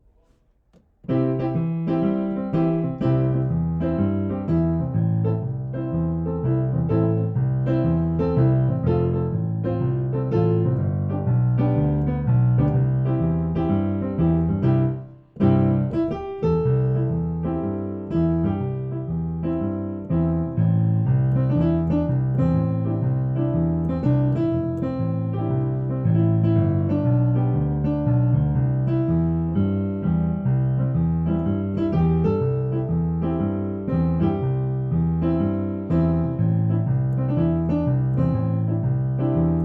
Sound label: arrangement jazz